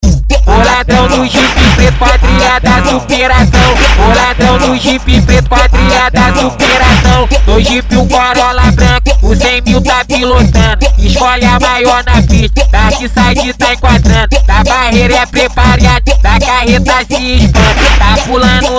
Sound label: music